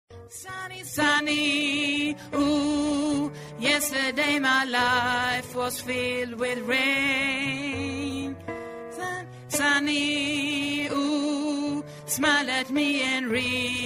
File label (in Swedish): alt, stammor